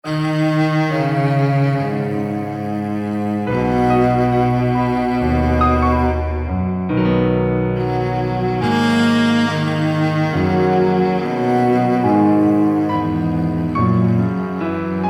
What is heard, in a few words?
Cello and Piano